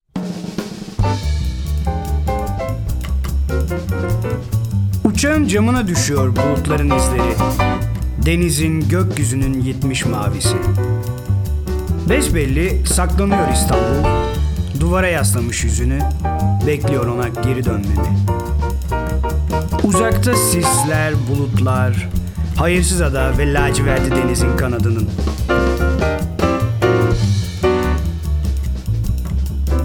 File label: Interlude